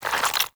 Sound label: Sticky Ink Tentacle Retract; Gameplay; Mutator; Warframe; Shadowgrapher